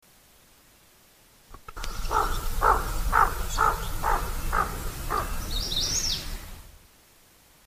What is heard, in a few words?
hooded merganser